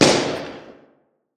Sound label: bang; bang sfx